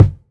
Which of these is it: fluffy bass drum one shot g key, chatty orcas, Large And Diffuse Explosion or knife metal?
fluffy bass drum one shot g key